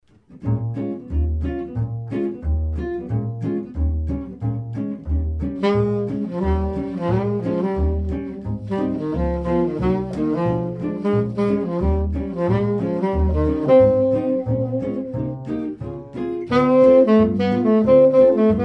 Jazz Trio; Netherlands Jazz Trio

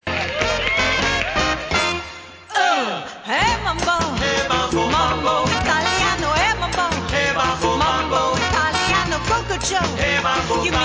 Italian show band mambo

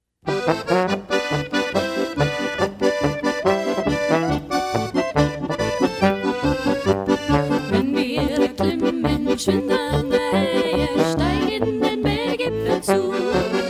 German Band; Austin

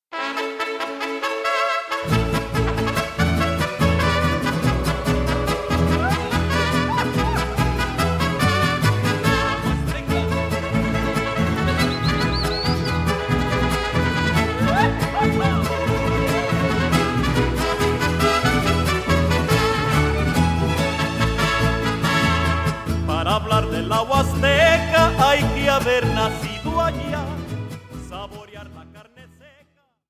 Mariachi

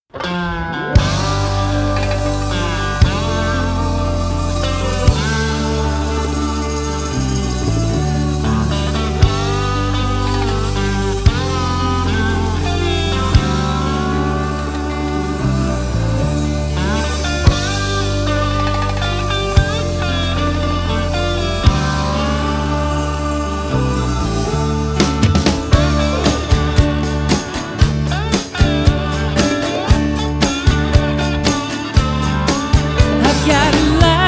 Country Singer

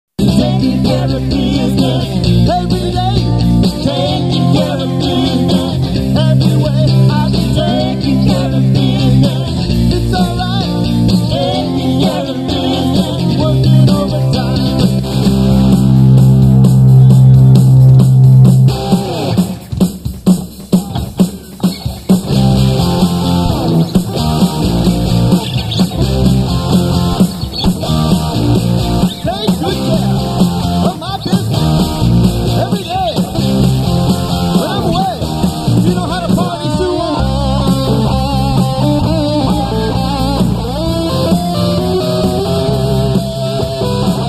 Classic Rock Band

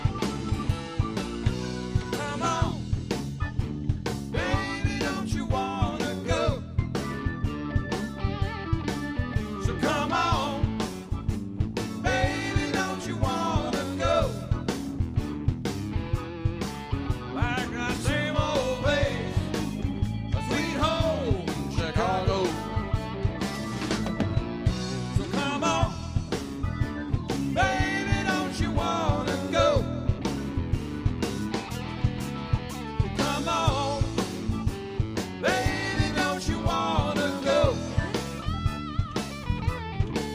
Cover Band; Orlando; 'live' band

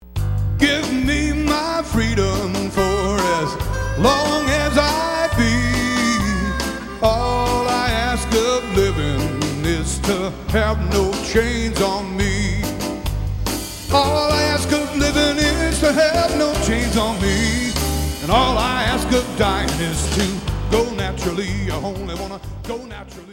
Tribute Band

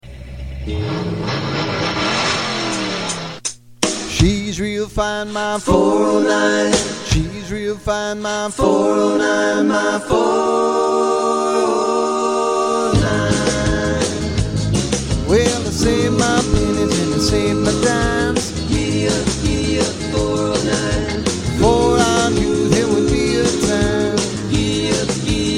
50s 60s Band

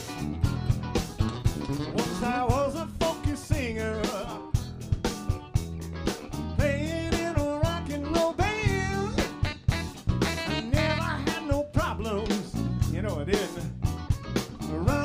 Cover Band; Orlando

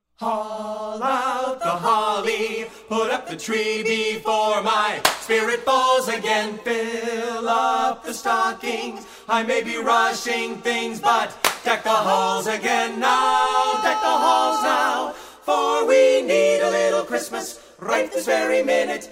Carolers; St Louis

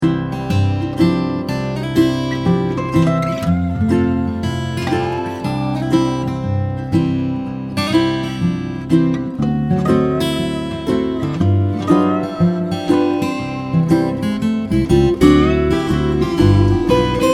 Hawaiian Guitarist

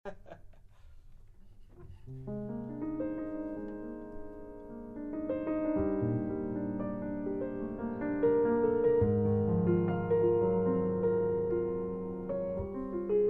Jazz Trio; European

